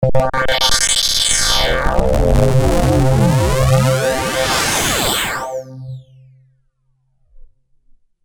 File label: Stereo FX